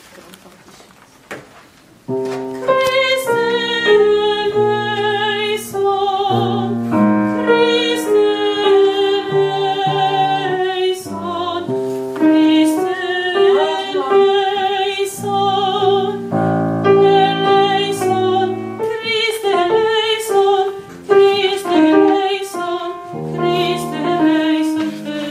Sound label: alto; christe